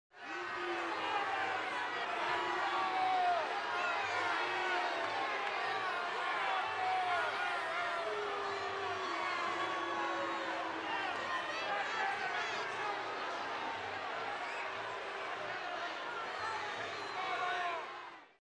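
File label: Crowd 1000 Boos Steady, large interior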